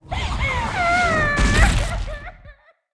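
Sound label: ghost woman attack, monster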